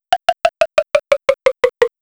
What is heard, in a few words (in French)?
Descente; Goblins 3; cartoonesque